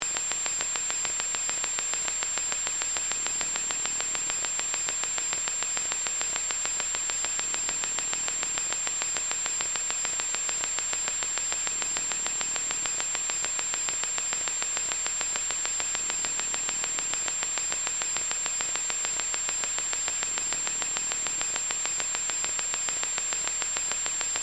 Communication Dead, Scifi, Communication, Dead, Air, Static, Loop, Science, Fiction